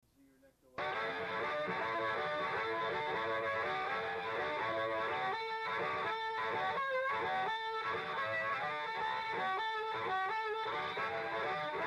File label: Blues